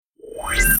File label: mention received; FightingGames; TWBlue